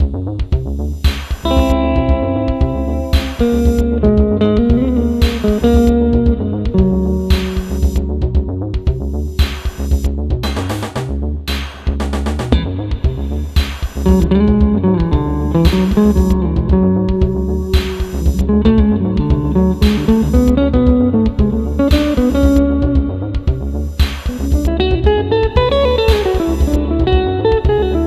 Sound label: tekno, Tekno Beat